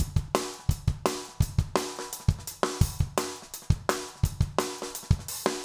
jungle

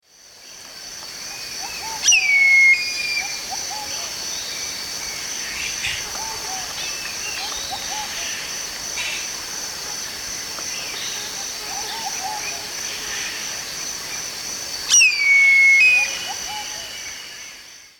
Leucopternis schistaceus, calls; S. America; Eastern Lowlands

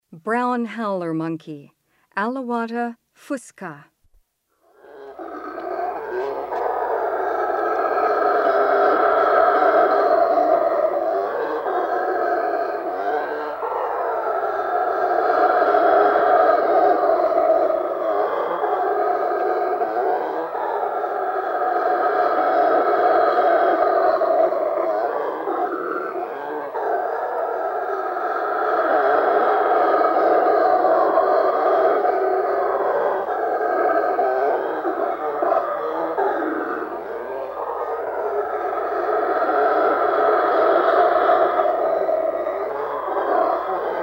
Brown howler monkey; S. America; Rainforest

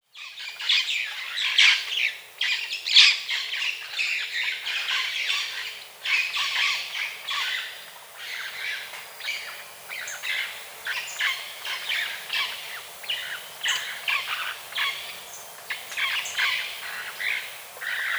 Red-Billed Parrot (Pionus Sordidus), Andean Birds; S. America

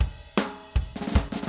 Drums, Rock

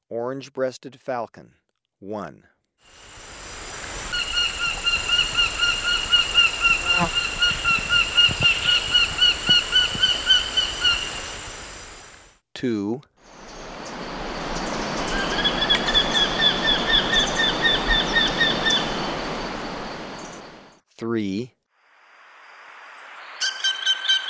Orange breasted Falcon, Birds of Ecuador; S. America

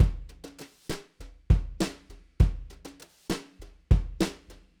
Drums, Jazz

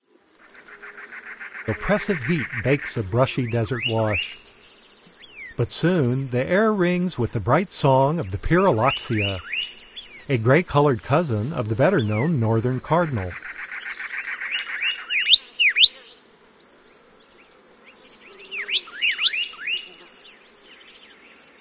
Pyrrhuloxia, Bird Sounds; North America; Common Western Species; Know Your Bird Sounds

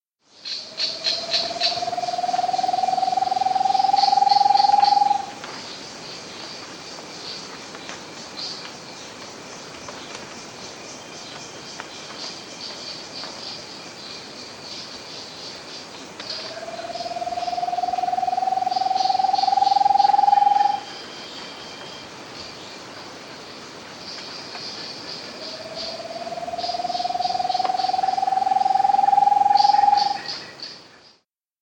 Great Antpitta; S. America

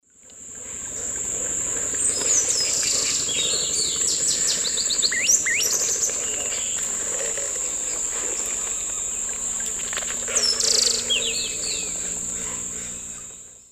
Molothrus bonariensis, song; S. America; Eastern Lowlands

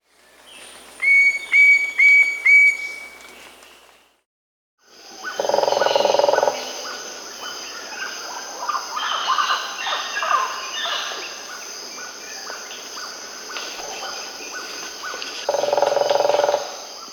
Ringed Woodpecker (Celeus torquatus), Peru; S. America

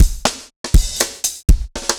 dancefloor tight 120

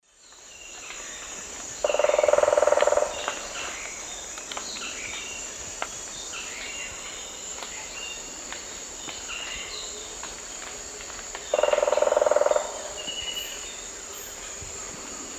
Celeus elegans, drum; S. America; Eastern Lowlands

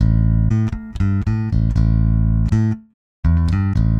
WORKING MAN'S BASS

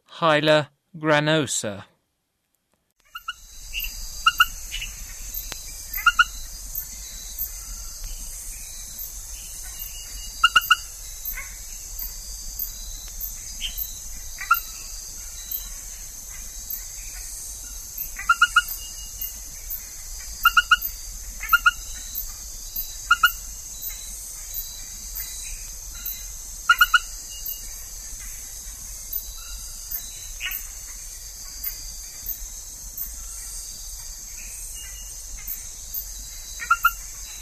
Hyla granosa, Frogs of Ecuadorian Amazon; S. America

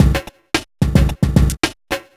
evil beats

